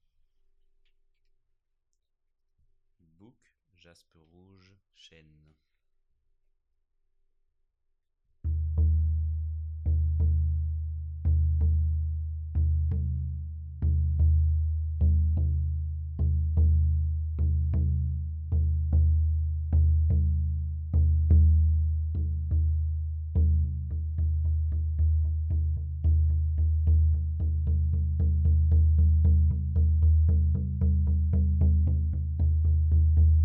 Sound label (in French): Bouc jaspe rouge chene; bouc 45 cm chene jaspe rouge